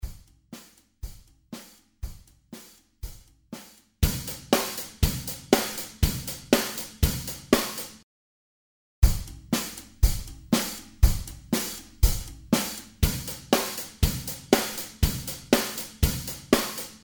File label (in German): dynamik; songwriting